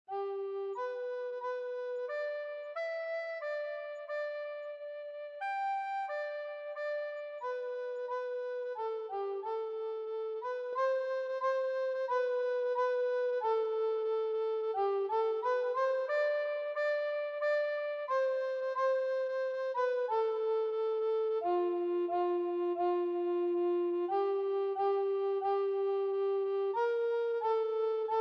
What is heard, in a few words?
evening prayer alto recorder; humperdinck